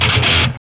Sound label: GTR